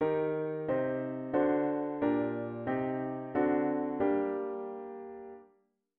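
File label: diatonic inverted V7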